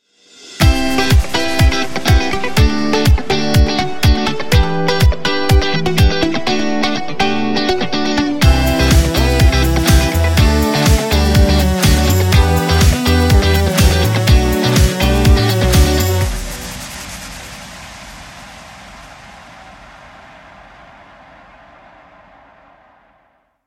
Energetic Pop; MIUI12

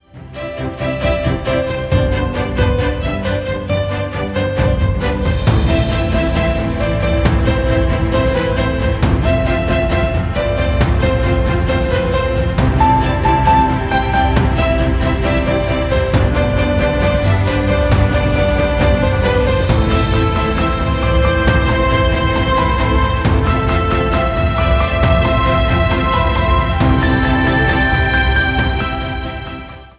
Resolute (Atmospheric Propaganda)

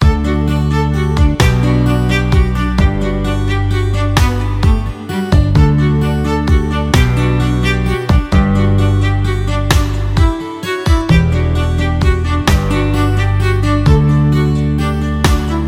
Sweet Love Loop, ringtone; MIUI14 and HyperOS 1.0

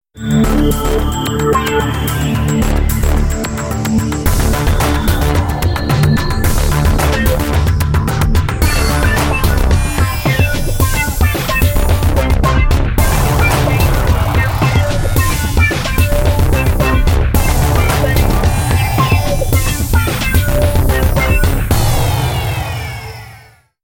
Electro Nights; MIUI14 and HyperOS 1.0

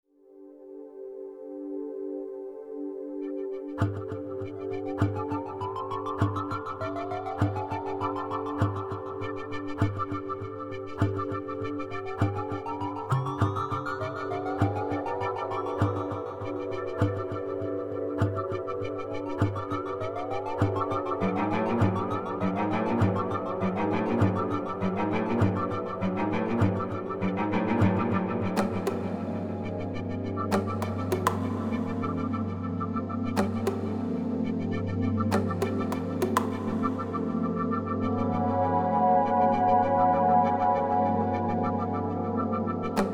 bio alert; MIUI14 and HyperOS 1.0

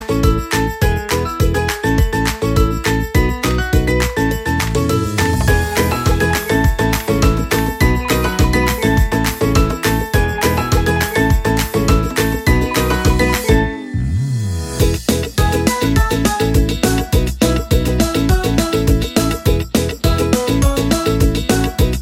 Upbeat and Cheerful; MIUI12

